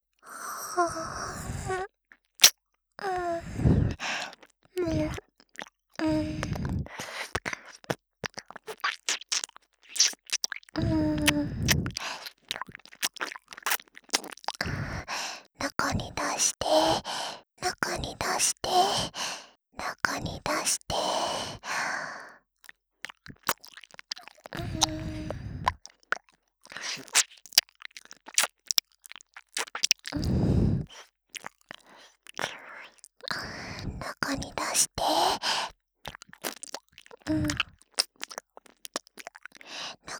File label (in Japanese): 中に出して 耳舐め; ハーレムオナサポ 4人のメイドがご主人様のオナニーをお手伝いするバイノーラル～文、千曜、名月、莉桜～